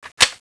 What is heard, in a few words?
clip out; tfa cso; m16a1